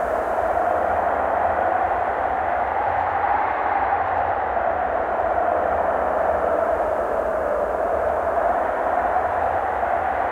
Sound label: Ambiance; Knytt